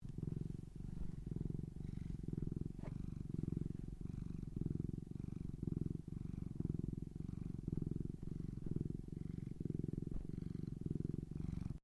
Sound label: Animal Cat Tonkinese Purr; Domestic cats; Cat Sound Effects; Cats (Sound Effects:Animals); Foundation1